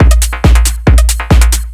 drum, 138, drum-loops, Techno

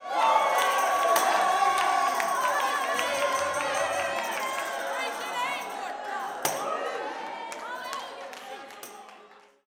TAMB, TAMBBK 160, Blues & Soul

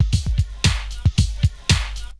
Lo-Fi, Essential beats

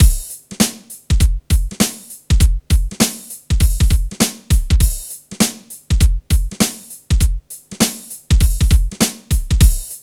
FUNK, Funky Xtreams; INIS

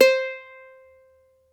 uke C4

